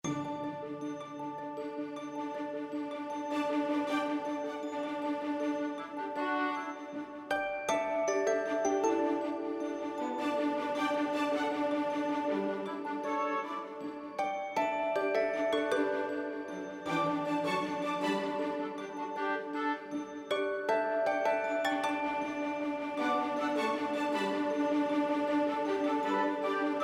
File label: Omnis Terra Tenor Only